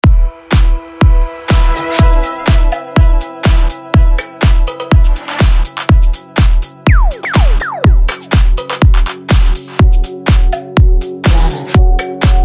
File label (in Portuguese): energy vibe eletronic music